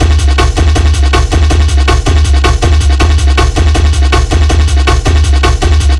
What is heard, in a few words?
160, Drum Loops; Total Drum&Bass